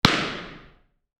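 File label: Impulse Response, Royal Drama Theatre, Sweden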